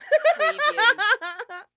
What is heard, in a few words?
Laugh Female; Sound Effects Bible